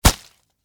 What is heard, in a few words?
Impact Flesh Chop, Axe; Horror; Blood And Guts Sound Effects; BlastWave